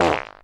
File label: fart short